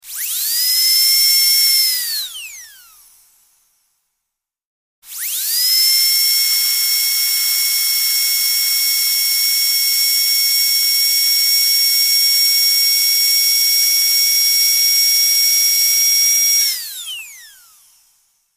Dentist Drill Spinning In Air; Two Times On / Spin / Spin Down, Close Perspective.